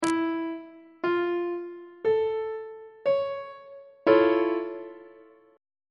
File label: e sharp augmented major 7th 3rd inversion on treble clef